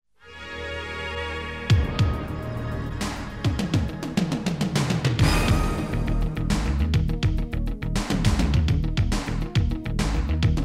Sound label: jingles